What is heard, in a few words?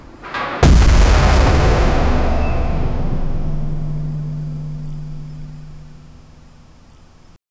massive steel shipping co, deep impact